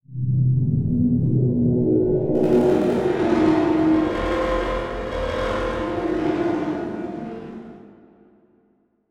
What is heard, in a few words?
Production Element Title Transition Demonic